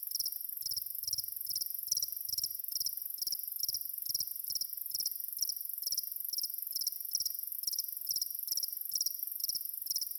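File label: ambience cemetery crickets; cemetary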